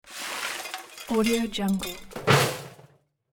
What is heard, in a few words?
trash swept into garbage can; hollywoodedge